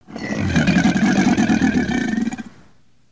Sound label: Pet Crocodile Howl, creatures; creatures pets